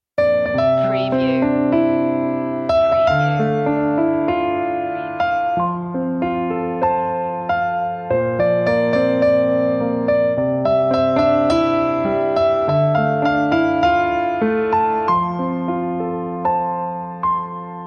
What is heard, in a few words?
piano; media music now